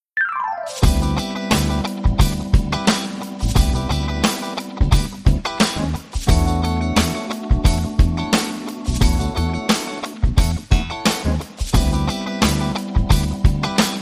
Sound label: Saxy Underscore